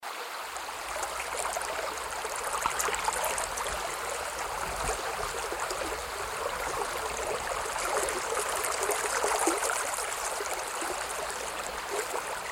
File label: whish stream